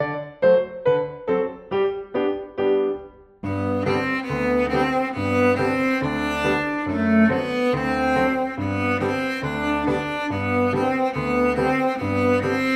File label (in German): das turbo cello